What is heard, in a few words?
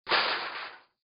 crash